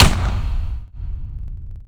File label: m1 garand; WAR